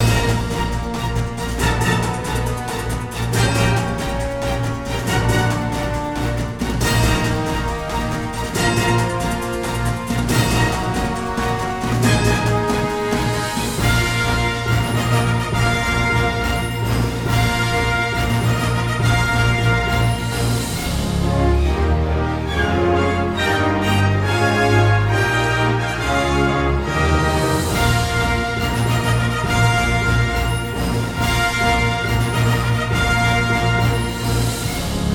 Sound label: Earthquake Bridge; superman returns; xbox 360